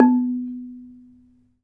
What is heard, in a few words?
B3, GAMELAN SET; Spectrasonics; Heart of Asia